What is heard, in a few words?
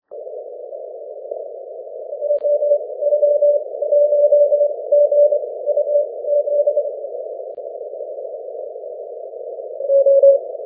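20m continuous wave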